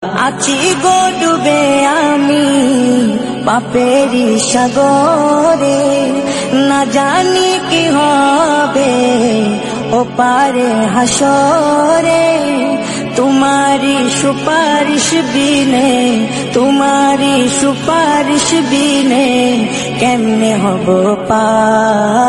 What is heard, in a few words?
ISLAMIC RINGTONE